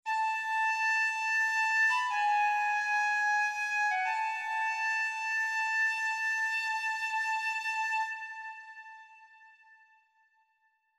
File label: calliope flute; God of War Chains of Olympus; psp